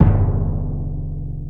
meteor

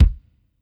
drum hit clap

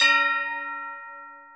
bell A#6; osu

